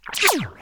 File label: Slow Laser Shot